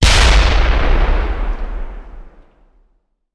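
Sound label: Artillery Explosion; Sound Ideas; Guns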